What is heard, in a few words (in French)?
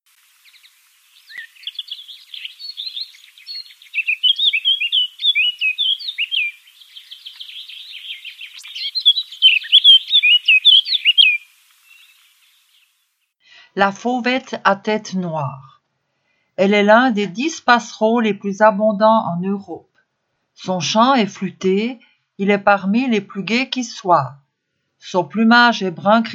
oiseau Fauvette; La fauvette à tête noire